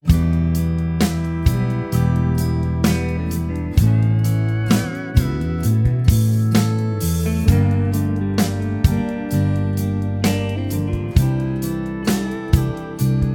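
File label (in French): Tristesse, les émotions; Tristesse 1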